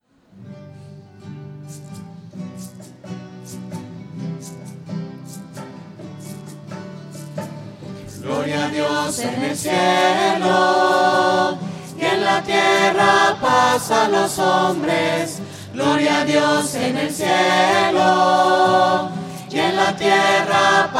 gloria voces; misa

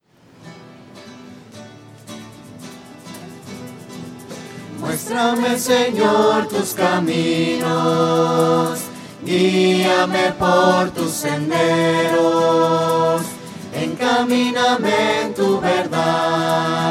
voces; muestrame sennor tus caminos; misas 2012

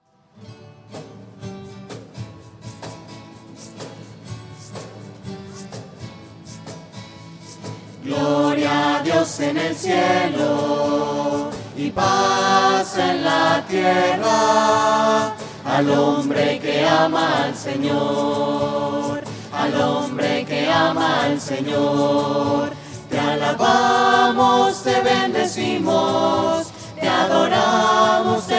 voces; misa

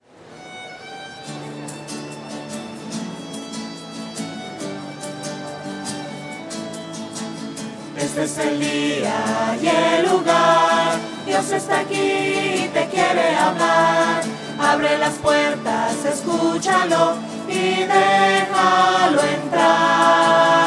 voces; alabanzas; misas 2012

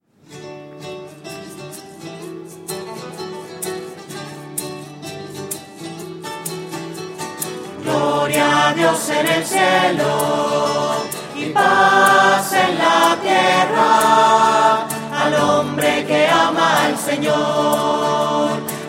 voces; misas 2012

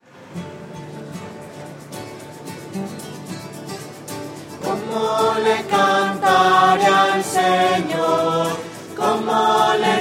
voces; misas 2012

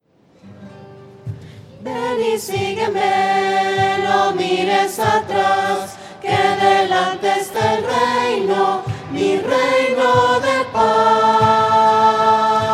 voces; misa

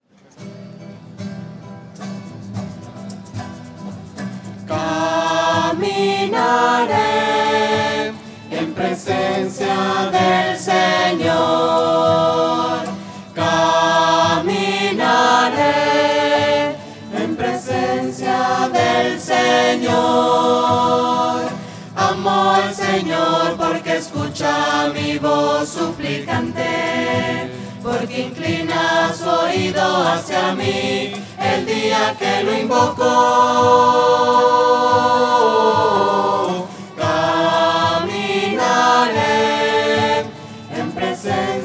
caminaré voces; misa

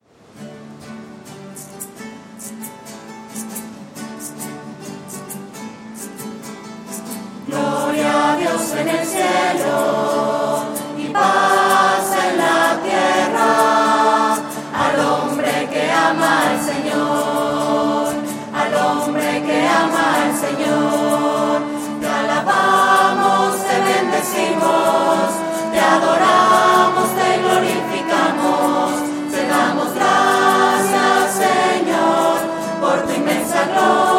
voces; misas 2012

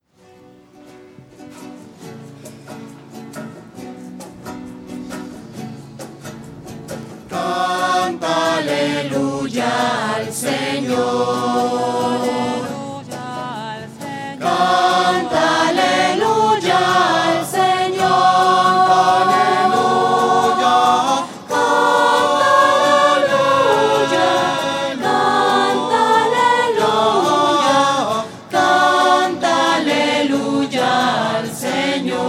canta aleluya voces; misas 2012

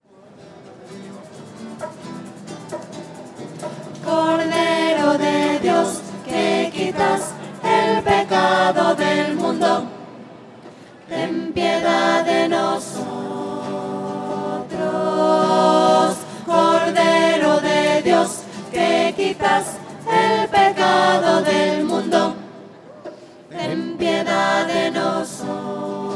cordero de dios voces; misa

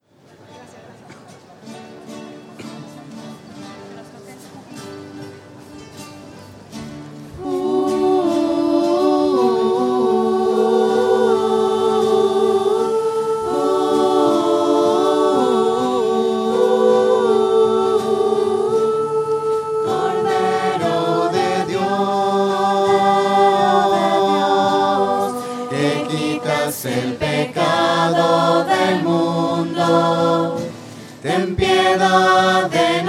voces; misas 2012

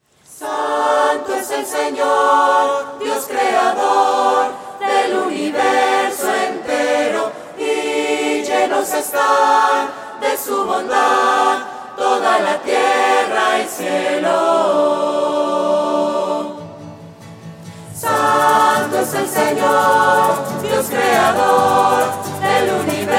santo fresa voces; misas 2012

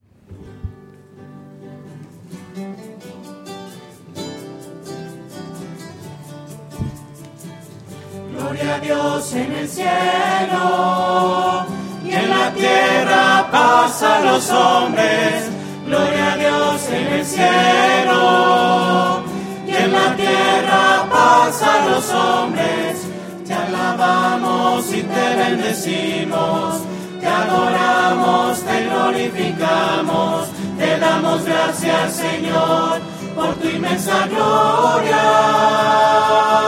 gloria voces; misas 2012

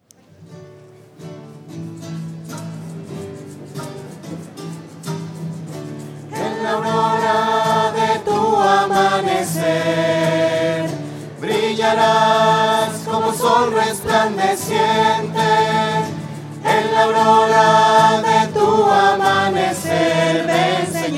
voces; misa

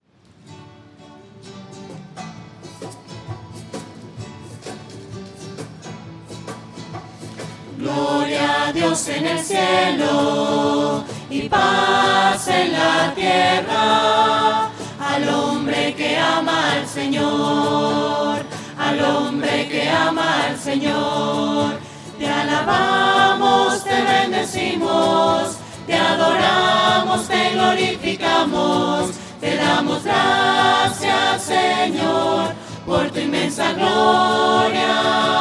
voces; misa

